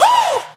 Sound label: Vocal Oneshots; Vengeance Samples; Vocals